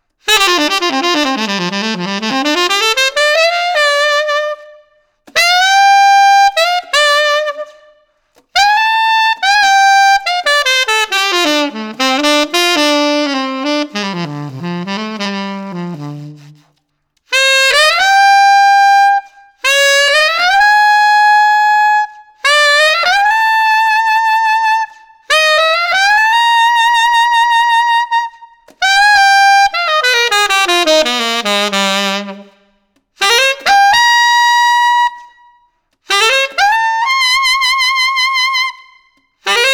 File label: Alt Reverb, tenor saxophone mouthpiece; les becs dautan mb1 tenor saxophone mouthpiece